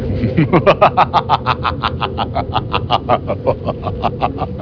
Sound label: evil laugh